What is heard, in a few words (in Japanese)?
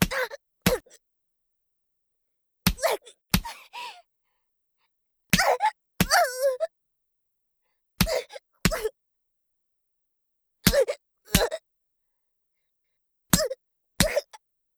ジャブ BPM90 ワンツー, ASMR